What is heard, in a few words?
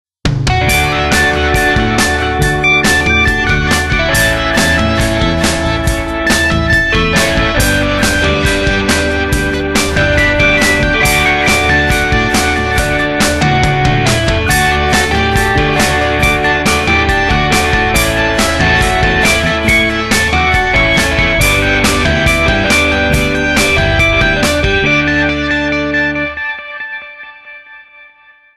Ring Anthem, ringtones; Microsoft; Windows Mobile ringtones; Individual download-only (2008)